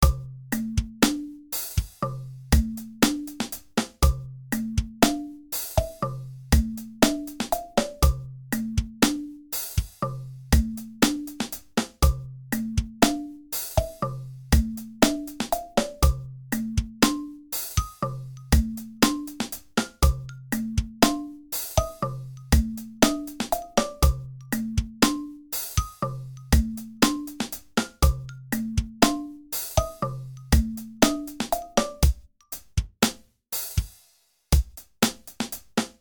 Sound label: bongo